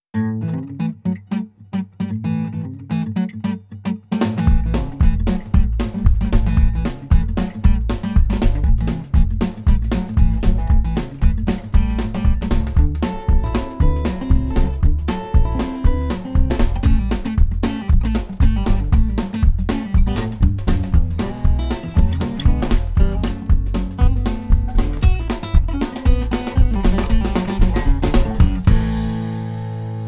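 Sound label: muffler jingle instrumental; WKXY; AA MUFFLER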